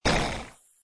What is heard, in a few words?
enemy down; plane fighting